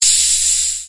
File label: venom effect; tyrants edge